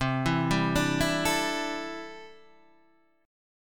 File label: C 6th Add 9th, C, down, Guitar, Half Step Down; chord